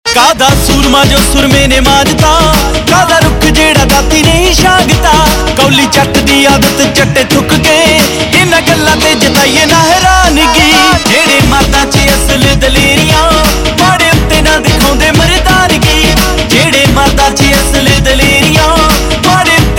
Ringtone, Punjabi Pop

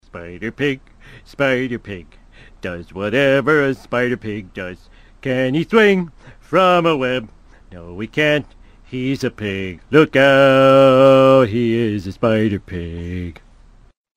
Comedy Ringtones

